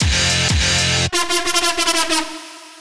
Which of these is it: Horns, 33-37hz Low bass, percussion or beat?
Horns